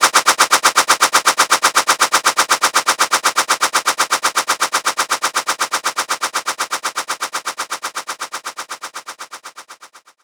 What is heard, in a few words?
swords